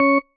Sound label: marimba tissue; ゆめ2っき